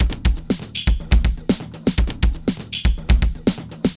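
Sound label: drum loops, right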